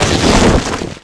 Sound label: hit plastic strips; hits; Tony Hawk's Project 8; XBOX